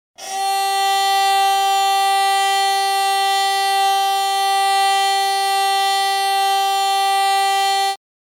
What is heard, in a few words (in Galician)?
cantante; musica